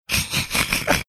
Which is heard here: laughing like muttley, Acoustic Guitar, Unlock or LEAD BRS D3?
laughing like muttley